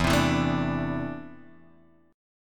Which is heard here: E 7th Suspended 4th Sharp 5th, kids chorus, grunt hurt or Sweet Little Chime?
E 7th Suspended 4th Sharp 5th